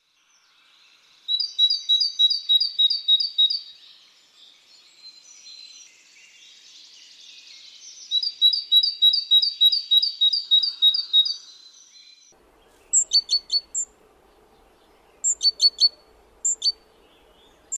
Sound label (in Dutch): Koolmees